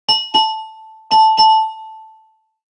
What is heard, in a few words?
Sinepad sms, Nokia; 8800; Alert tones